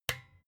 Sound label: desk lamp off